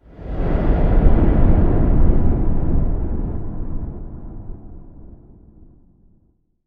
Forest Amb

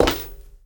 On Stone Walk

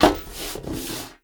Stone Turn; moul

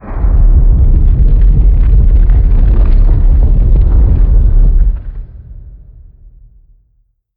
Glow Sphere Open

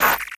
Grid Force

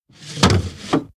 Music Player Button; moul

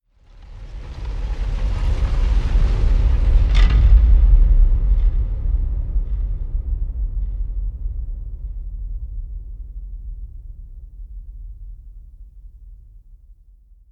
Floor Elev Pillars End

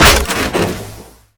Fumerol Cap Blow; moul

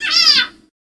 Creature Button; moul-assets

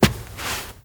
Rug Turn

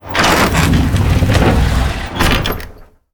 Slave Cave Gate Down

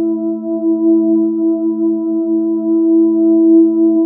Symbol Build

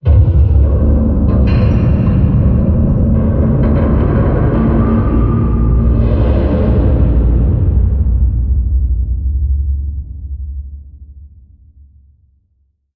Hut Shutter Open

